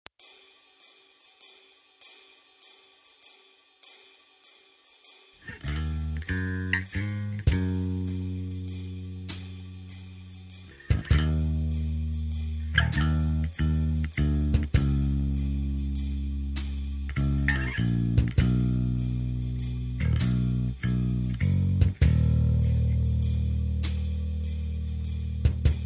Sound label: Electric Bass